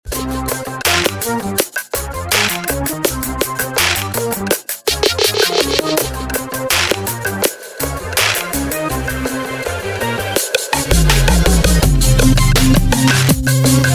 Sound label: Crunch